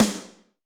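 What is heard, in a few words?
Snr Studio A, snare; Alesis D4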